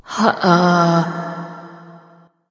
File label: confused light; smite; voicepack; hel